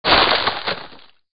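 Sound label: rock scrumble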